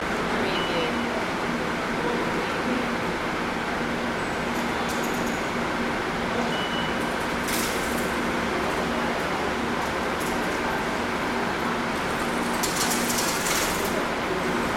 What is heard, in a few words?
kuala lumpur train station loop; the sound pack tree